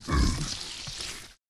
zombie attack

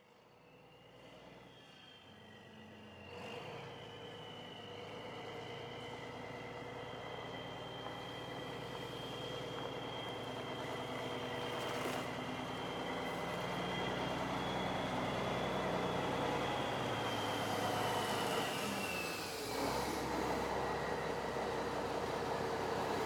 Semi Truck; Blastwave

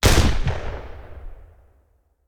Hand Gun; Sound Ideas; Guns

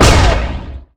Gun Sniper Rifle Shot; Weapons

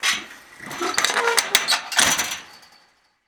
Gate Metal Fence Close; Doors

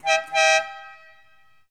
Ferrari Dino Horn; Automobiles

